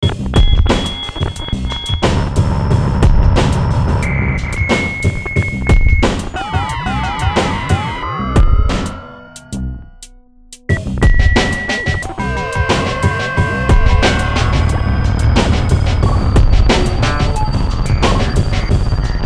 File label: hiphop